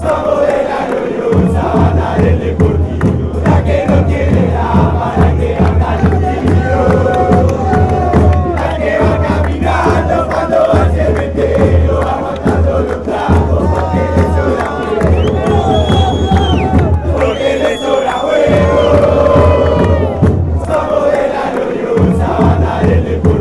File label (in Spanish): chant, argentina fanchants; deportivo merlo